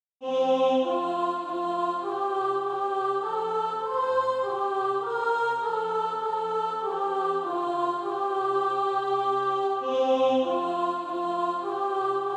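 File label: soprano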